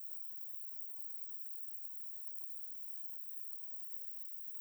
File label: single pickup, Gas Leak; crysound; Handheld Acoustic Imager